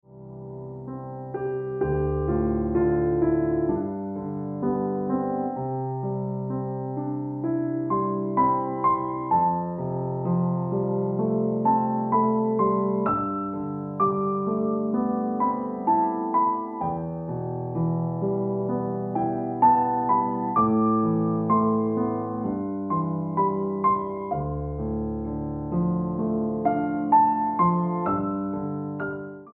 Early Sunshine Ringtone Piano Music

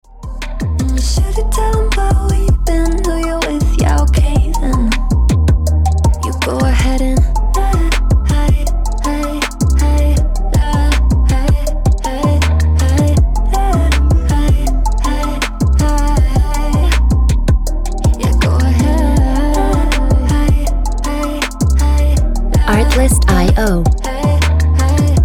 Lie Ringtone

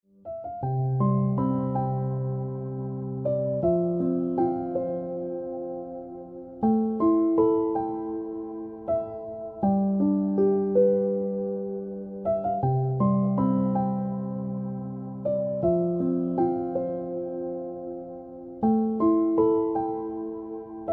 Piano Music

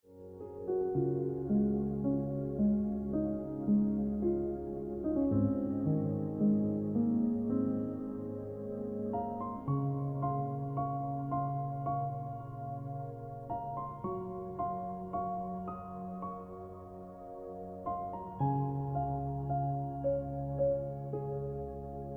Celestial Ringtone

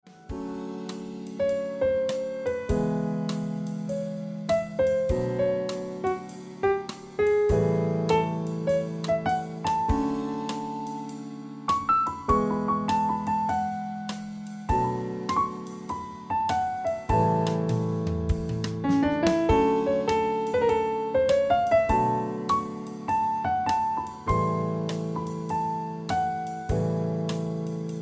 Ringtone

